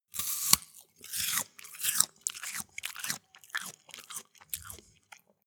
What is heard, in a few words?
apple sequence